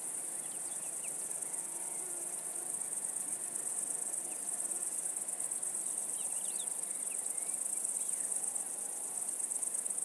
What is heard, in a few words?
insects; overte; Toybox